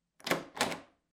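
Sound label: door handle sound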